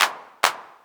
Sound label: 909 Clap